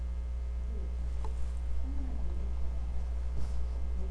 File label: spray